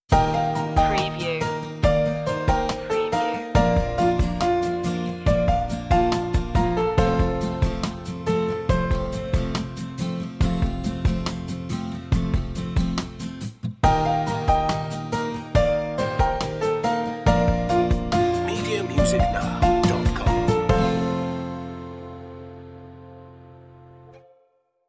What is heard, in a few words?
acoustic